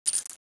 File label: coins; dm cardboard